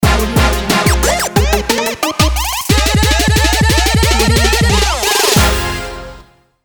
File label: trap logo